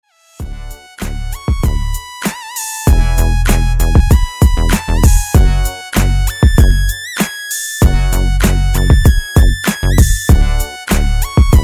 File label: instrumental; GTA SA